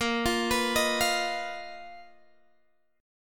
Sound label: B Major 7th Flat 5th A sharp